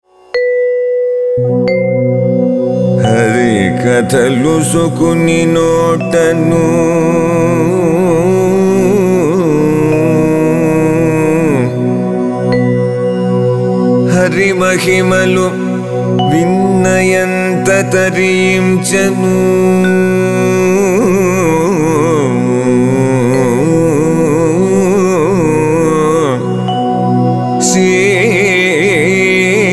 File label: Padyam Harikatha